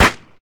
Rescue Blast End

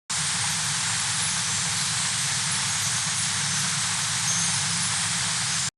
rain sound; Blue slush cookie

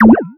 agar agar slide; cookie run

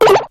slide; Cookie Run

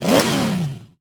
Transform Start; Cookie Run; Kiwi

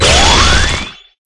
Grow Rock; PDragon

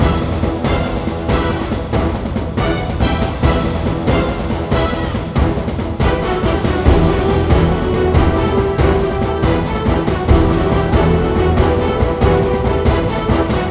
Finding; Ingame Field Raid

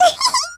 slide

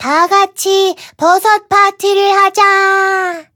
levelup; cookie run

